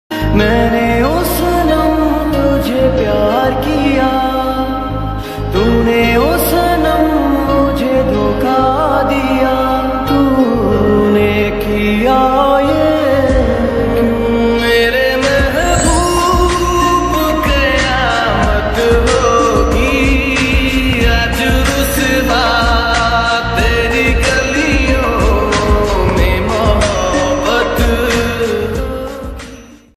slowed hindi ringtone

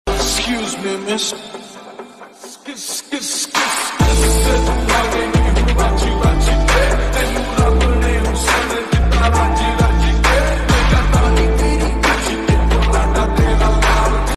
lofi hindi ringtone

